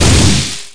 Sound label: Elplode